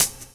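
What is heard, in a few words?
hi-hat; USB Soundscan vol.46; AKAI; CYMBALS; 70 s Breakbeats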